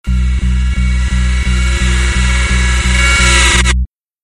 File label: Intense Pulse